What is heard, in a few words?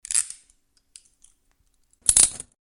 handcuff